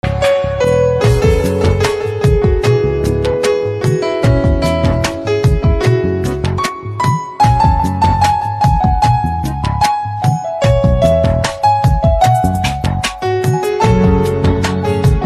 Piano Instrumental Ringtone

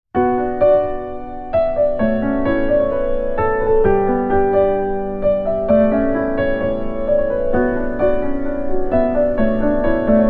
Piano Instrumental Ringtone

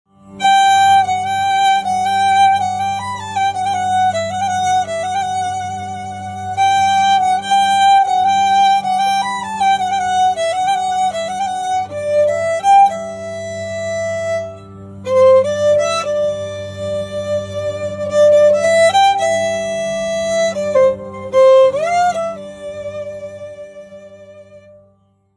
Violin Ringtone

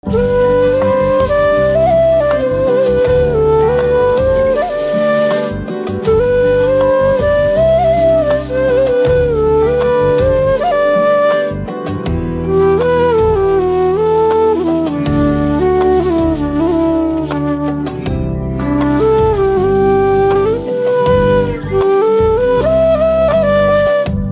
Flute Instrumental Ringtone

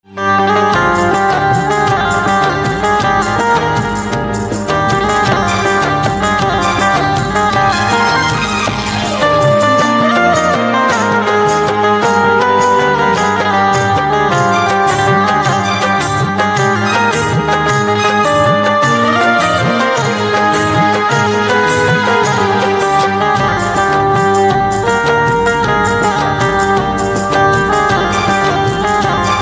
Banjo Instrumental Ringtone

